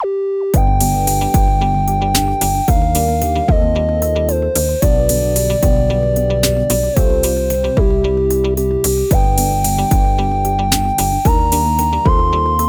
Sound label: BGM Lose; Clubhouse Games: 51 Worldwide Classics